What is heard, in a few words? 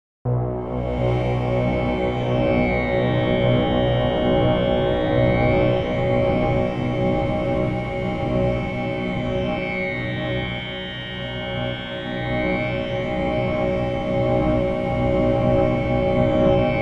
inside danger ambiance